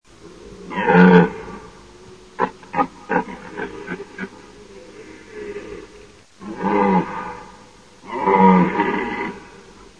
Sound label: DEER